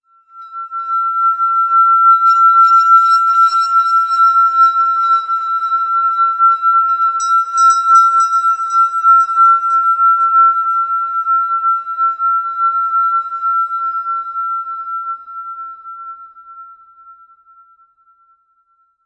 glass bell rubbing; Bells

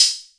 606 cymbal, drum machines; 606 Basic

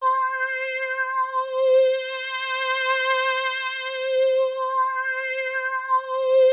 Elka C4, synth; elkaph synth

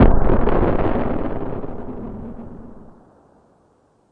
creepy distorto explosion; Sound FX Real Life

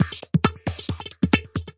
smooth robot pipper, drum loops and breaks

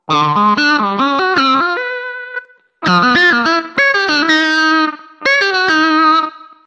diddlededee, Guitar Noizes

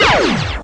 Electro FX; Ueb HE Electro FX

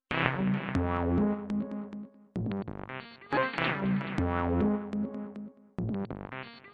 echo acid thing; WORLD LOOPS

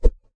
kung fu staff, swings

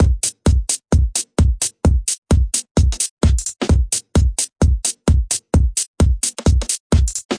130 bpm, garage pippyhouse, drum loops and breaks

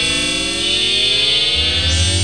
bending bell; Bleeps Blips Blonks Blarts and Zaps

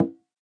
conga Low Tip; ETHNIC and WORLD PERCUSSION

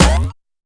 fx drum, Electro FX

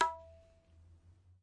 Ta, Tabla; ETHNIC and WORLD PERCUSSION; Tabla Kit Large

